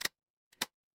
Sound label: ui unlock